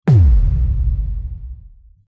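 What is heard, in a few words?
Strong Boom Transition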